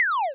Zap

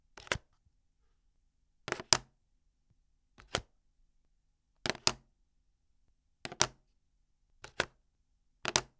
Remote Pick Drop

